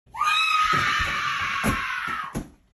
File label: scream